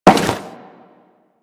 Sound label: Crewman Step Default, Footsteps; Warframe; Corpus